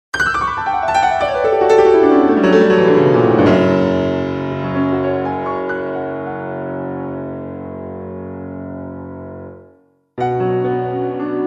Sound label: guitar toccata rain